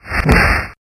spin kick

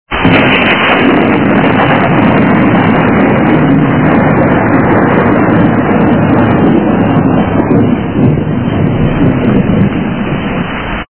thunder storm

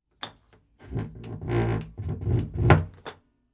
Door Closing

